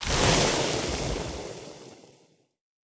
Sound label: relic megaorchid; Stellaris; grand archive; relics